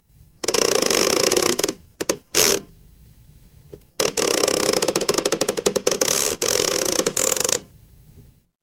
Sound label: horror creak